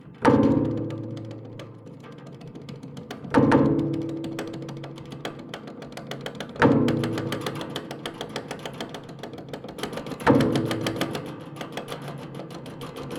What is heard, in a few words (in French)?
corde grave